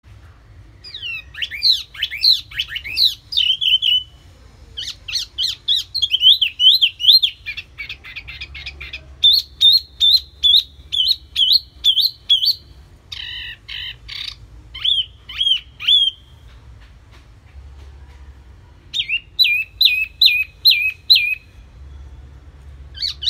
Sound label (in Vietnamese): Tieng chim Tieu Mi hot